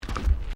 Footsteps Decking Wooden Step; Crocs; Footstep Foley; Human

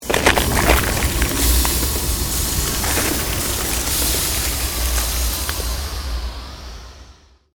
CREATED MAGIC SPELL BLIZZARD SHARDS; A series of magic or arcane spell sounds designed to suit fantasy themes, Arcane,Created,Fantasy Magic,Ice Magic

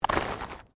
Body movement Slide Gravel; Body movement foley to simulate falls and deaths; Bodies (Sound Effects:Human)

